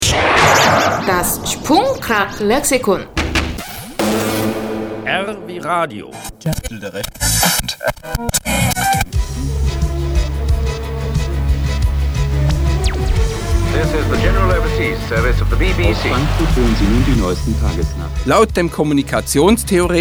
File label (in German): Radio